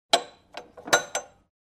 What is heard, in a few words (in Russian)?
phone